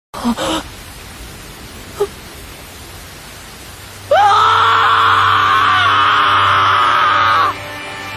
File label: Clouds Scream